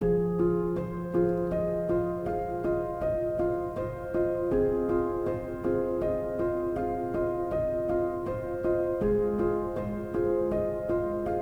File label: Carillon; relaxing music